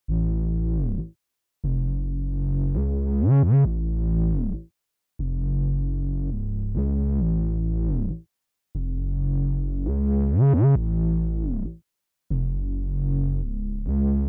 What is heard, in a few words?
135 BPM C# Min Bass; Cymatics; Miami Guitar Pack - Pt 2; Mania